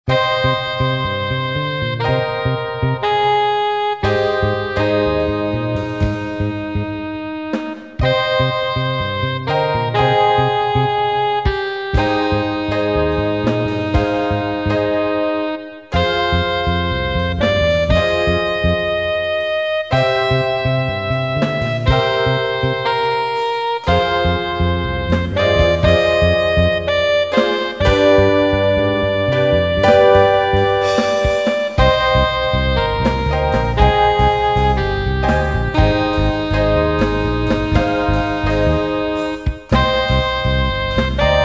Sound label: SAX SOL